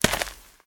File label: Step Dirt